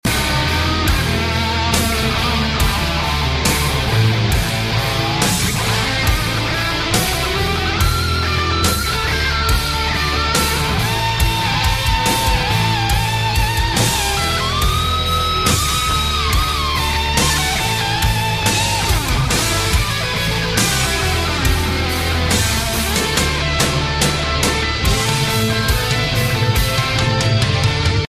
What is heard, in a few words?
GUITAR SOLO RINGTONE